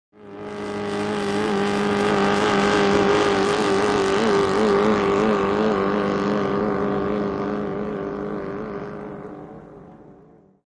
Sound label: In Outboard Boat By, motorboats